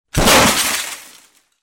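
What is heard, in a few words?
one car into another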